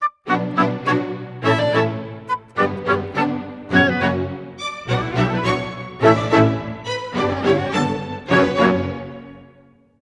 105 b min full mix, Orchestral arrangements

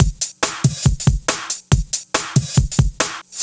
Break Beats, 140 BPM

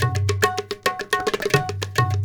India, Heart of Asia

